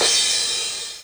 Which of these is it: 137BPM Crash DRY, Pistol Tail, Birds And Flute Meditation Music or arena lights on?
137BPM Crash DRY